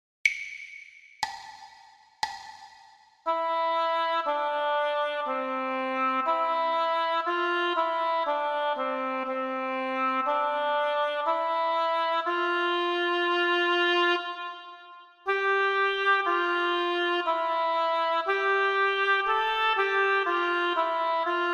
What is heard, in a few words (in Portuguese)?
percepcao ditado